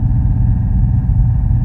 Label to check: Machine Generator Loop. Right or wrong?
Right